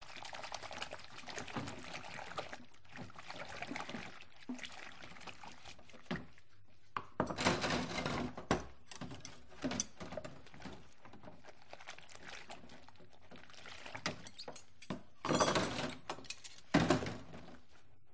household kitchen sink dishes cutlery washing water background hum